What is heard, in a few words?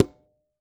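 Conga Lo Mute; Roland BK-5; Percussion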